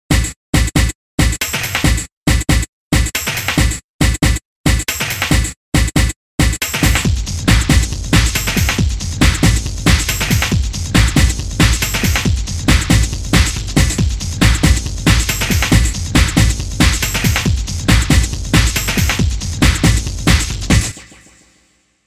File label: Beat